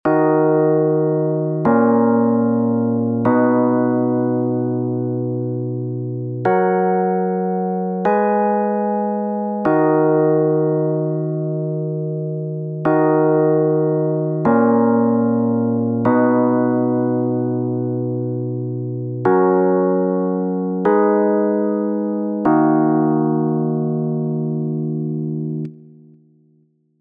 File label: Verse Full